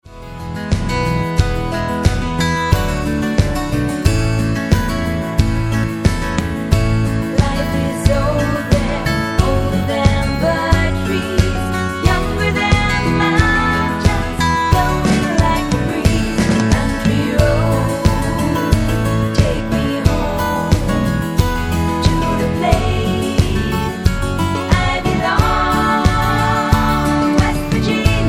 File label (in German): mit Chor